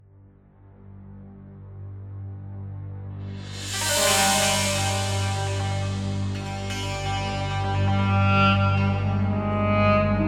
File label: start level loop